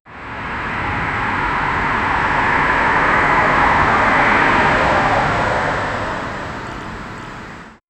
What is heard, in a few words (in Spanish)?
un auto atravesando el, large room